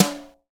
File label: DRM SLAP, Brushes; Roland L-CD701